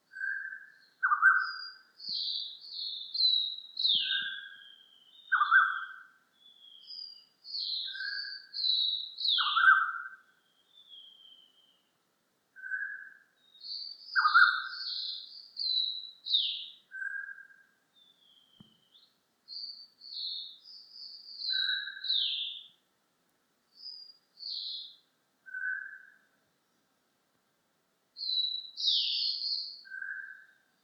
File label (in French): oiseaux alishan